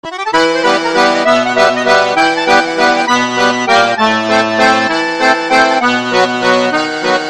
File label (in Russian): akkordeon na sms